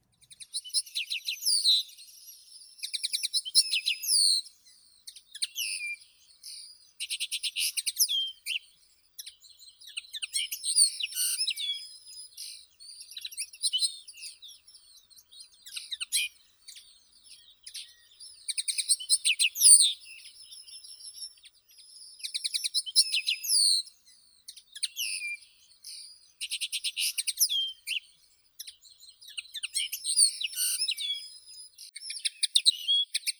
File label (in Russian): konoplyanka acanthis cannabina